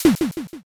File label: play card